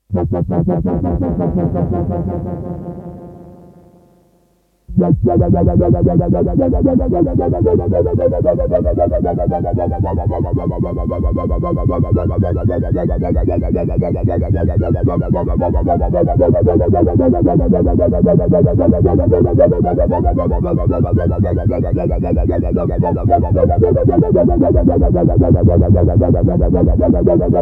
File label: Synth